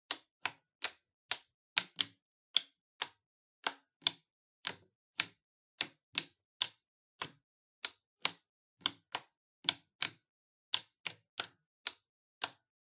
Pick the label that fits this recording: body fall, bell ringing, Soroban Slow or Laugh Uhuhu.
Soroban Slow